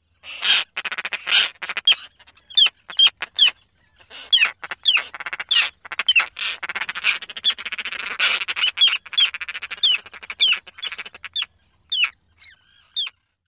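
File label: ROSTER calls at nest, roseate tern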